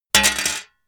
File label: metal plate thin drop